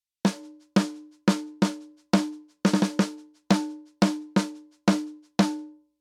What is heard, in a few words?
snare on